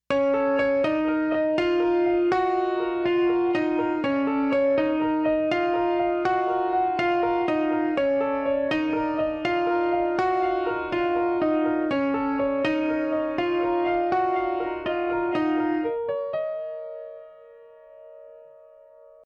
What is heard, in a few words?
Piano